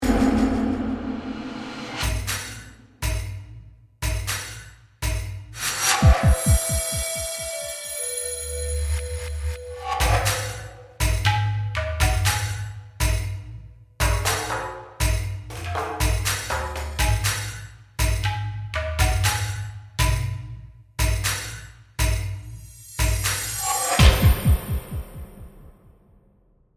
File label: Composition Tribal, Production, Element, Composition, Tribal, Jungle, Adventure; Blast Wave